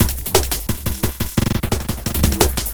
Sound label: Glitched Beat 175BPM; glitch kit